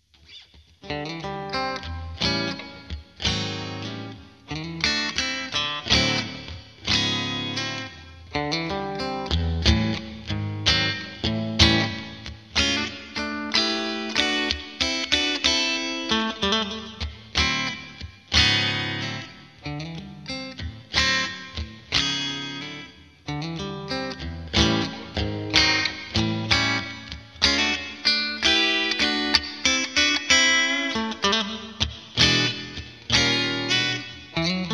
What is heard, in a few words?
Bridge Twin Clean, strat56